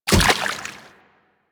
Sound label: Kubrow Step Water, Footsteps; Enemies; Warframe